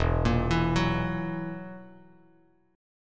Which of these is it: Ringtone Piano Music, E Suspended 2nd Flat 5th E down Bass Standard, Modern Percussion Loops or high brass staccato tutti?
E Suspended 2nd Flat 5th E down Bass Standard